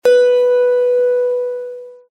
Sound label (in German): Zithermacher Ton 8 LAUTER